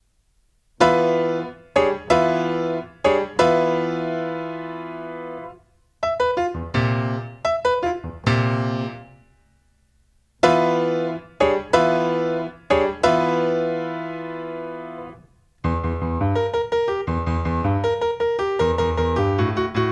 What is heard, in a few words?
Piano; CONCERT RADIOPHONIQUE A L’AUDITORIUM XENAKIS DE STAINS